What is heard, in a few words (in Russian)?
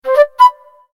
korotkij zvuk na sms samsung hello